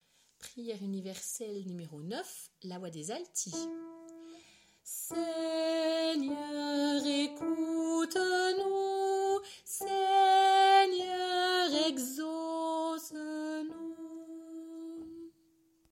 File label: PU9 alti